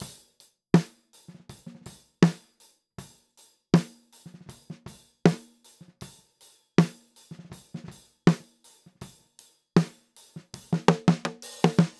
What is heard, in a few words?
snare slingerland test snare sm57; drums